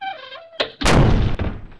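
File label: door close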